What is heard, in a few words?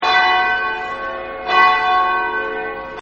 Church Bell; Bells; Musical; Partners In Rhyme